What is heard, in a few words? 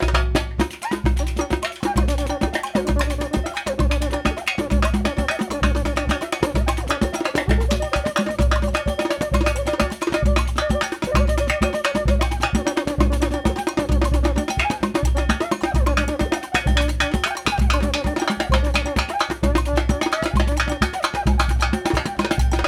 Rio Batucada, 130 bpm